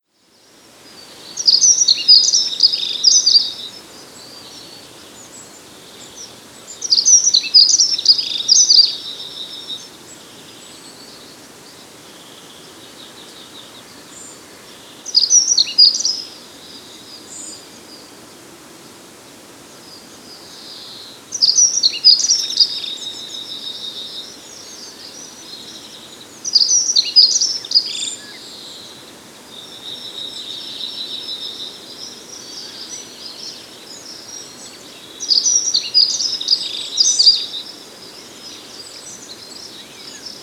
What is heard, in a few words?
Dunnock